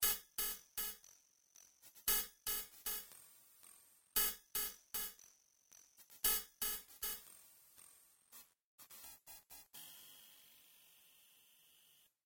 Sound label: Victory; Mortal Kombat 3; Genesis